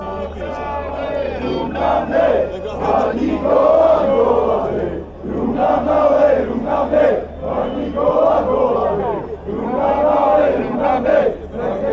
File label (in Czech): rum, chants